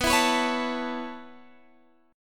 B 9th Suspended 4th B

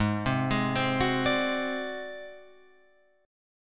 A flat 7th Flat 5th A flat Guitar Open F, chord; Ab7b5 arpeggiated; JGuitar

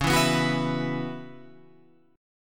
Db Minor 11th Db Guitar Open F; Dbm11 strummed; JGuitar

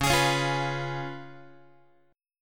Db 7th Db down Guitar; strummed; x,9,11,11,9,10; JGuitar

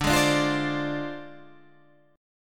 D Minor 7th Flat 5th; Dm7b5; strummed

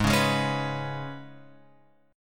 G sharp Minor Double Flat 5th G sharp; {4 4 6 6 X 7} strummed

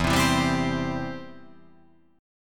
E-Minor Sharp 5th; x,7,10,9,7,7,9,9

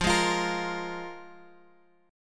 F Minor Double Flat 5th, Guitar, Open F; Fmbb5; strummed

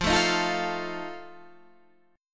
F 7th F down Guitar Standard; F7 strummed

